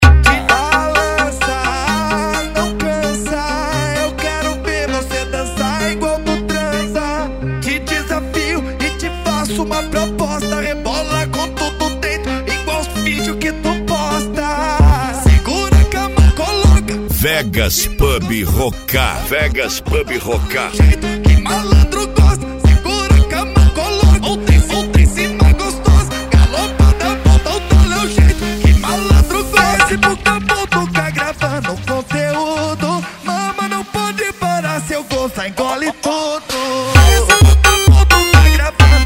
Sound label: funk mega bass